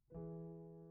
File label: Piano Mono